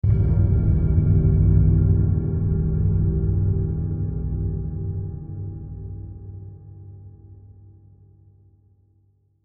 Hit Cymbal Metallic, Hit Cymbal Metallic Reverb Low; Blastwave